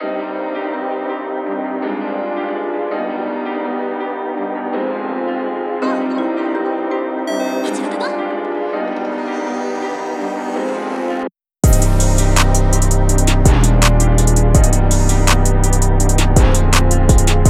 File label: Beating Heart, Cm BPM165